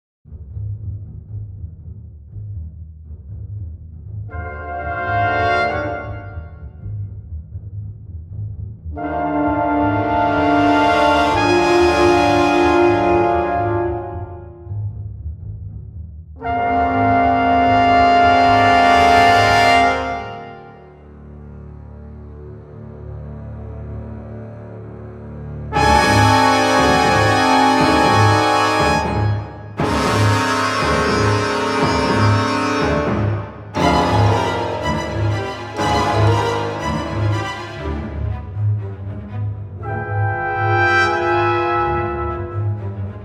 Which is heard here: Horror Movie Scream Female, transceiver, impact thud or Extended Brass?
Extended Brass